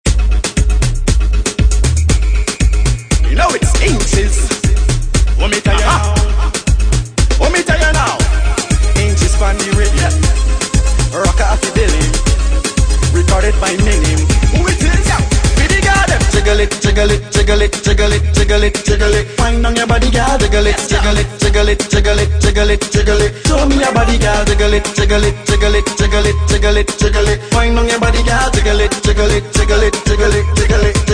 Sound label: soca